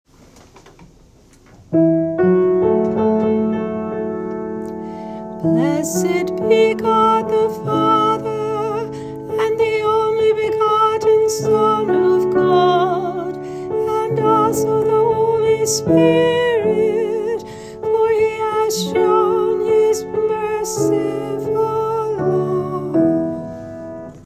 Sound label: Antiphon; Most Holy Trinity